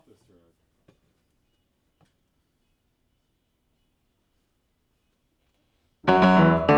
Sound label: Piano; keys